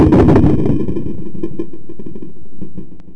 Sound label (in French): boum